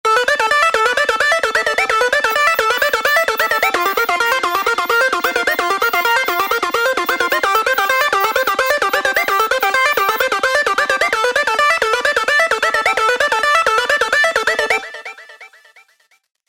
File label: dj music tone ringtone